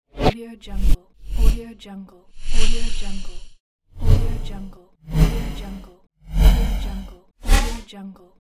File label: whooshes; whitedotaudio